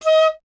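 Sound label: flute piccolo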